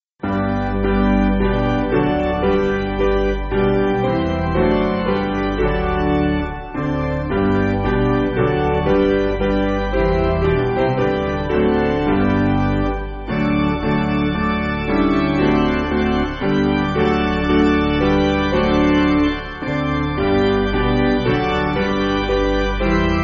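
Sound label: Piano, Music Backing; How Firm A Foundation; Lyons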